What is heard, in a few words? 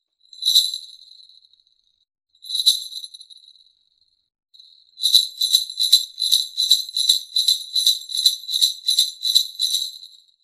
bell